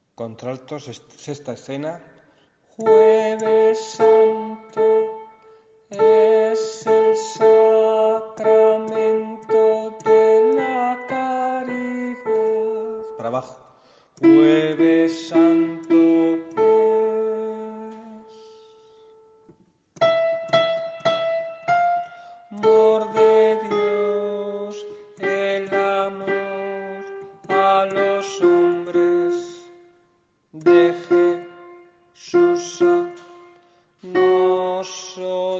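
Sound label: alto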